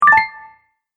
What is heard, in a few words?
Game Quest Right; video game; Video Game Sound Effects